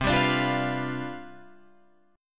C Major 7th Suspended 2nd Suspended 4th, Guitar Drop D, chord